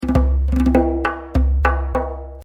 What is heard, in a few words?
4 Beat 100